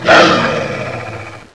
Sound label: attack; Necromancy